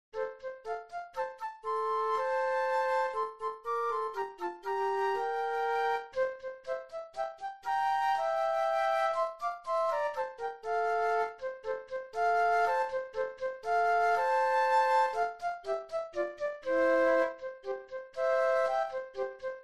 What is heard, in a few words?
Flute Duet